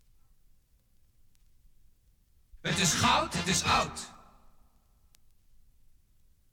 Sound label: topformat maxi jingle single